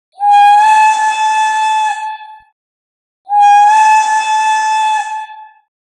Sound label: Steam Train Whistle